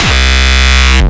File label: Speedcore Kick